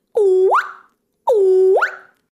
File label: war cry